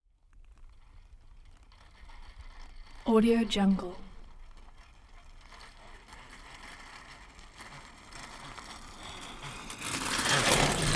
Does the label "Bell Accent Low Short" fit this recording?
No